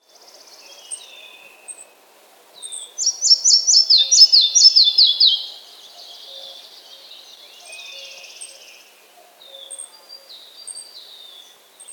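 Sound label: red-breasted flycatcher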